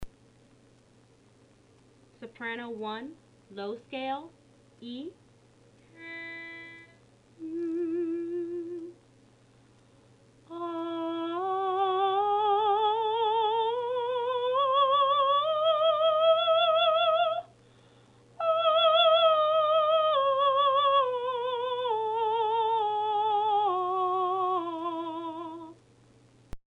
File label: soprano low scale